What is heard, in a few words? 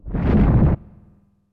Floomph; Super Smash Bros